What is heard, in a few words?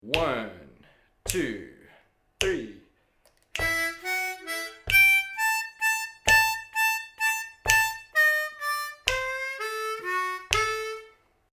Lick, harmonica